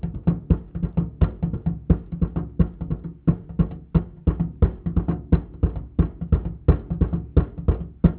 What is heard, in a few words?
percu